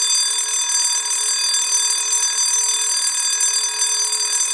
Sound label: alarm bell loop; world misc